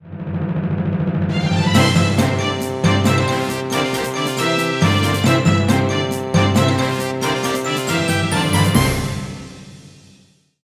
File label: Stage Clear